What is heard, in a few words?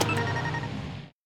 wrong answer monster car rave